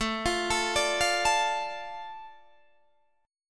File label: A Suspended 4th Sharp 5th, A, Guitar, Standard; Asus4#5 arpeggiated; JGuitar